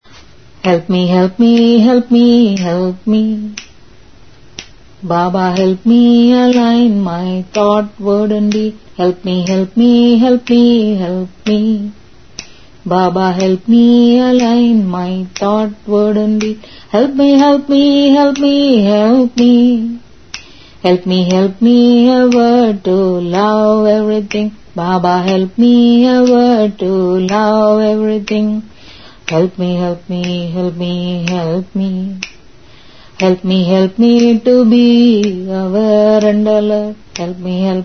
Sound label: Bhajan; Help Me